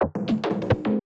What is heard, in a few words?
foley; bespoke - Floral v.2